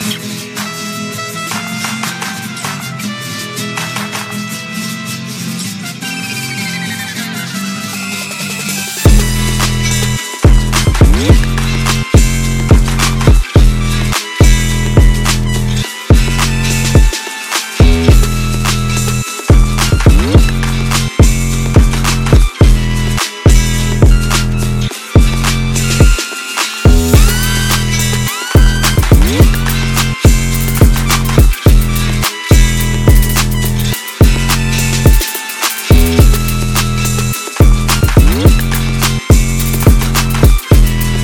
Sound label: Flamenco Trap Remix